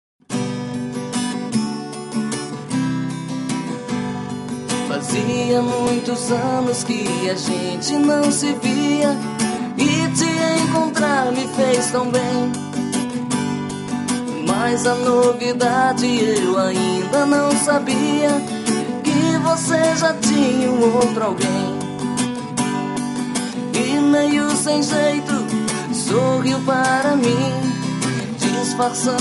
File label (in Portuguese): brega